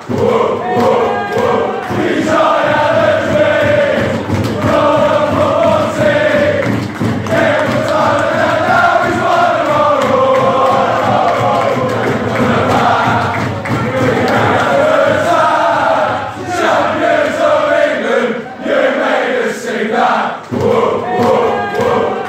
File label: leicester fans singing